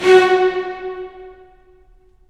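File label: STR, strings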